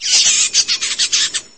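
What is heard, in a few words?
cry of fighting birds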